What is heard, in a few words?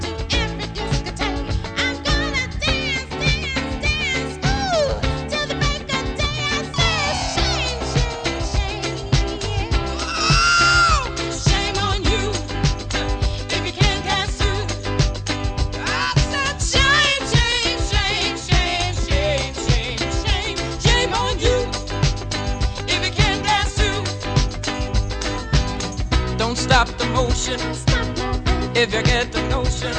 disco